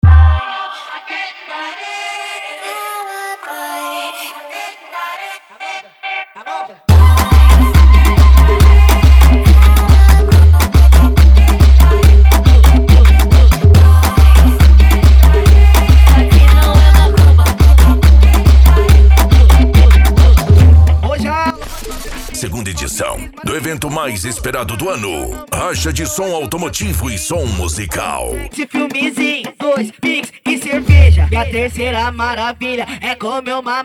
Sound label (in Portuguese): racha de som automotivo e som musical